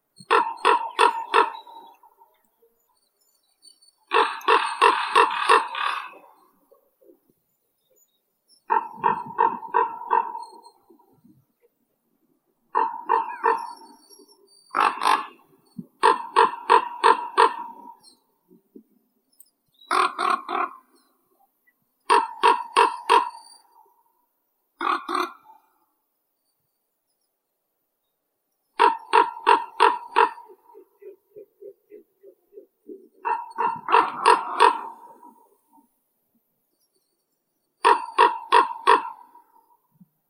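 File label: common raven, Corvus corax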